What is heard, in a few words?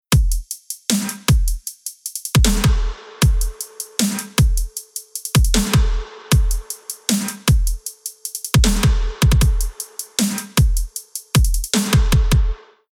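Future Bass Rhythm Dr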